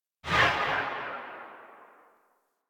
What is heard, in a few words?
Tail Ext, Weapons; Supra Vandal